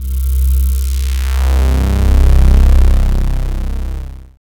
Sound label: SYN SWP